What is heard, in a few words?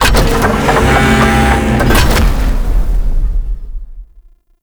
Void Dragon Ring Turn Start; Enemies; Gameplay; Warframe; Duviri